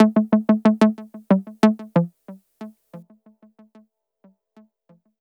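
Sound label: synth, Hip-Hop & R&B; USB Soundscan vol.47; AKAI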